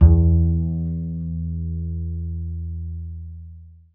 KONTRABASS; Alpine Volksmusik

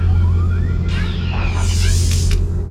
FAHRST; Best Service; Advanced Mega Effects

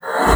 LUFT, bassoon; Xsample VOL-6

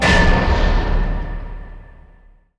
METALL; Best Service; Advanced Mega Effects

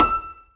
ZIMBAL E 5; Best Service; Ethnic Journey Sound Cube

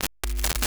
KNISTER; Xsample VOL-9; NOISE

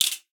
RATSCHE, percussion; Session Instruments; Best Service ProSamples vol.42

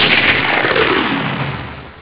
donner; ST-XX Amiga Soundtracker Sample Packs

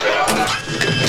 ROBOTE; Best Service; Advanced Mega Effects

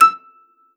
LAUTE E6; Xsample VOL-8; LAUTE+FLG

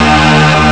chord dur; Amiga Soundtracker Sample Packs

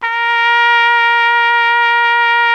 TROMPETE A#2, TRUMPET; Best Service; World Colours

